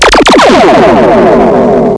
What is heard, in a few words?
LASER